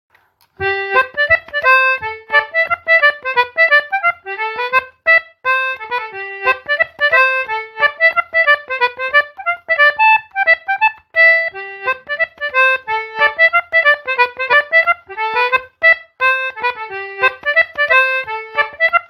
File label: Hornpipe